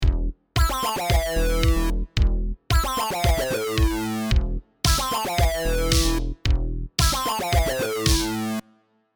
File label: synth; tutorial